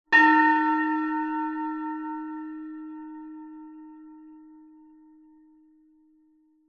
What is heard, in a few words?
bells medium bell soft strike long decay